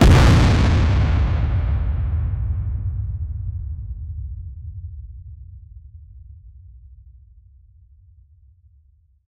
enemy attack tremor stomp

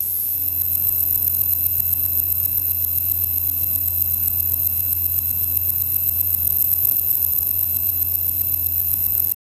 error notification; saturation

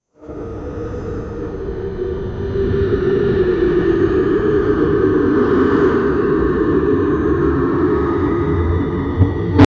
the most terrible sound o, hell

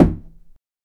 box open

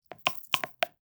pixel art wood chopping, axe

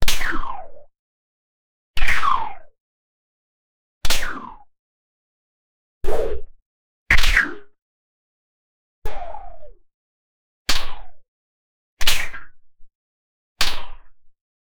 anime punching and magic, martial arts

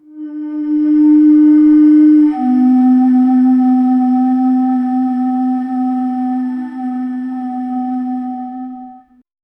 very low quena like flute

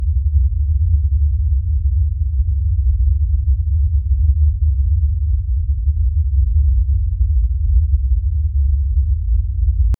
deep underwater ambient

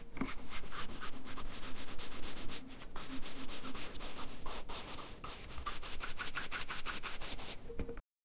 cleaning

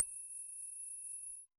short bright, reward

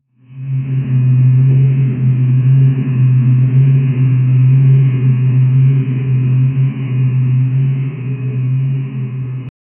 dark dungeon drone

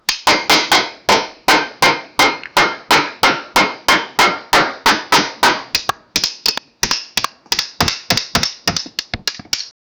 an angry man destroying a, computer

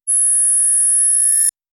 scanner sound short notification

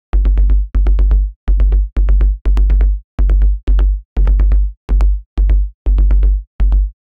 bass tech house one shot

